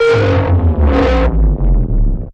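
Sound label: Blast Rough Landing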